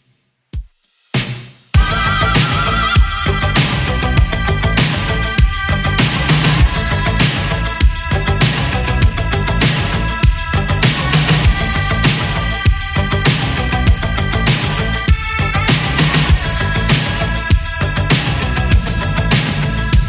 80s Redrum 99 bpm

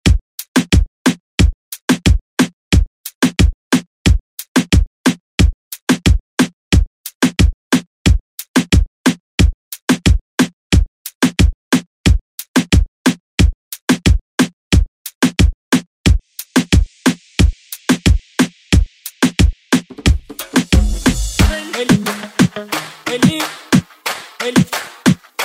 Latin Urban Redrum; Deejay Hit

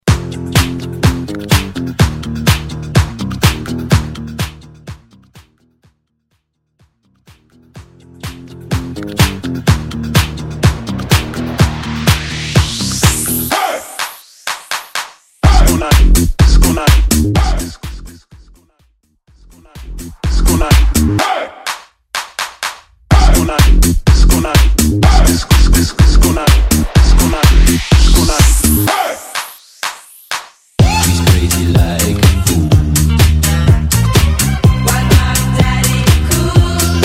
70s House Hype 125 Bpm; Mainstream VIP; Vegas Edit

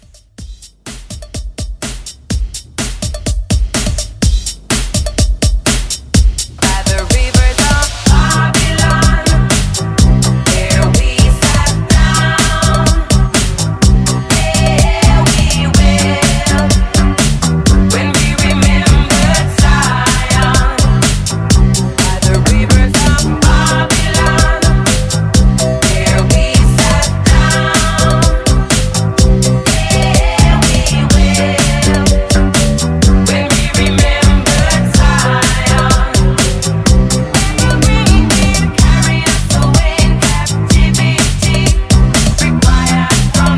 70s, 125; Re-Drum; 2024 70s Re-Drum

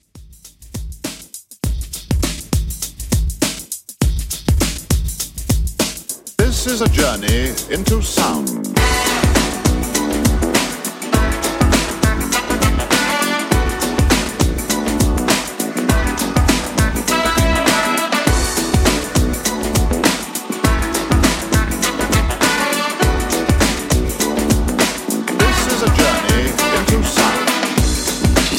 90s Classic Redrum 101Bpm

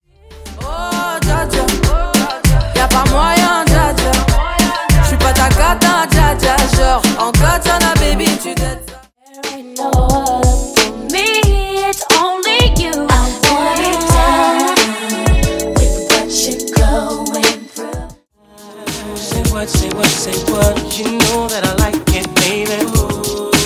RB 90s 2k Megamix Old School 105Bpm

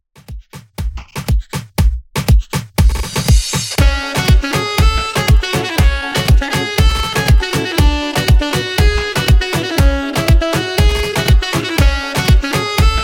Moombahton 120Bpm

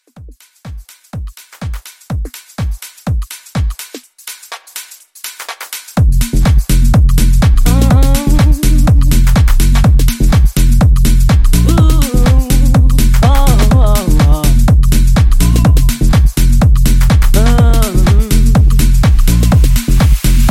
House Mashup, 124

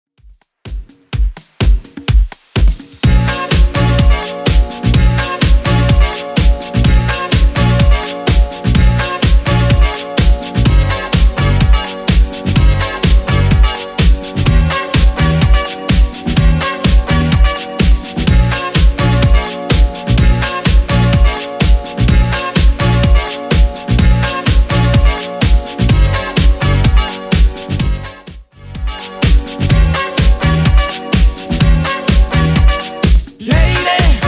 2ks Redrum 126 bpm; remixplanet

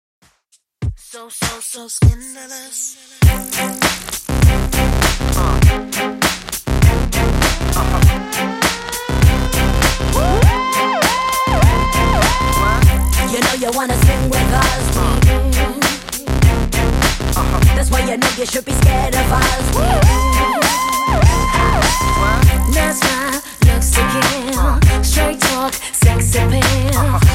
Throwback Rnb Redrum 100bpm

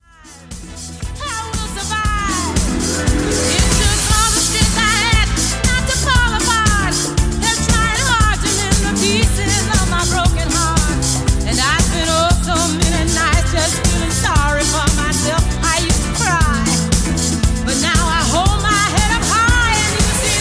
117bpm Am; REDRUM

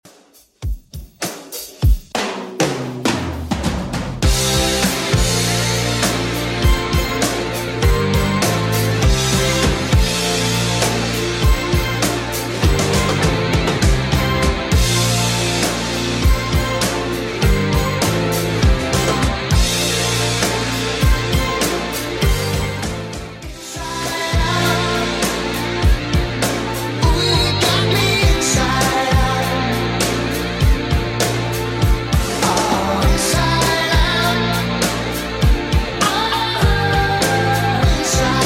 80s Redrum 100bpm CLEAN

